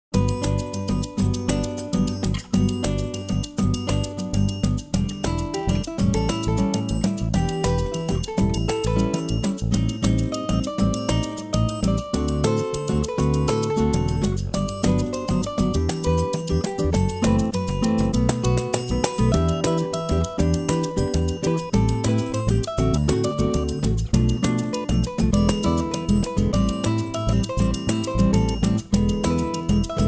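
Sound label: sunshine latin samba instrumental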